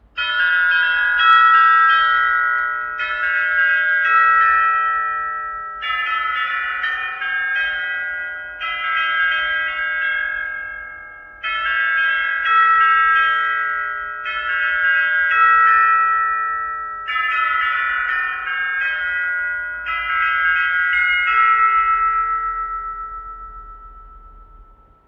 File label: Chime